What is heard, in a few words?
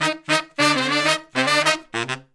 Horn Section